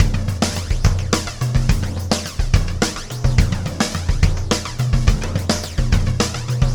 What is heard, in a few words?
Oscillation Beat